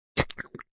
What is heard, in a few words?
Knfe In Flsh, A knife stabs into flesh; Stabs and Slices; Sound Effects:Combat